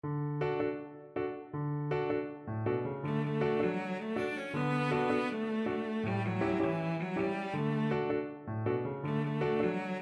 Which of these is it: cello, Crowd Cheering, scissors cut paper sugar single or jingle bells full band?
cello